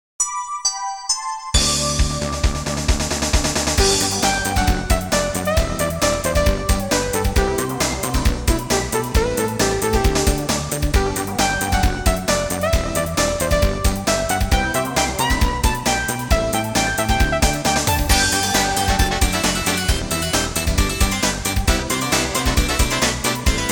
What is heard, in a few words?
Conversation; True Love Story; Special Song Box